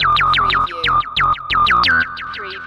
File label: Hip Hop Synth Licks 90 BPM - E - Straight